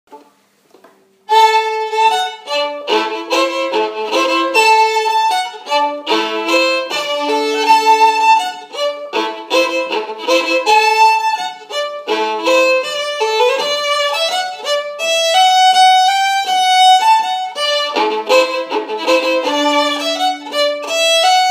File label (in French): voix 2 lent, violons; Toujours des fafieuls